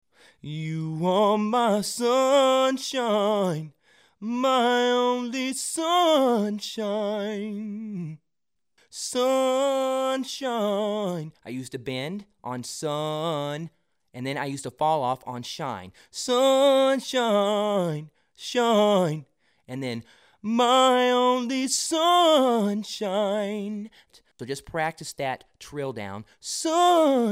R & B trill

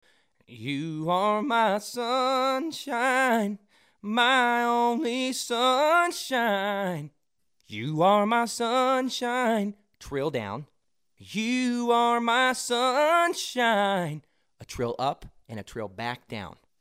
country trill

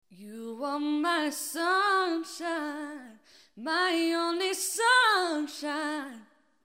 Female R & B trill

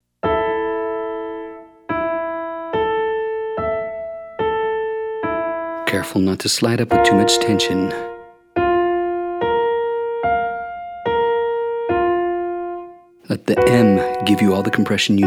Your Turn female

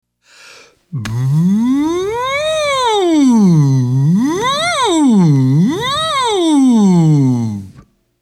muffled OH; singing lesson

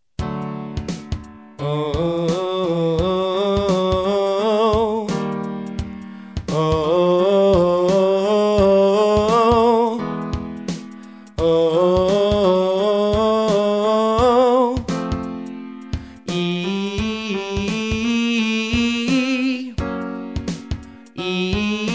Triplets up 5 tone scale

